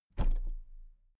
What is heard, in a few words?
toolbox place upright soft